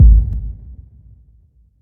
huge bass drum sound G sharp key, kick drum tuned to the G# note; kick drum samples